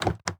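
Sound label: Truck Cherokee Door Open; SFX Kit; Trucks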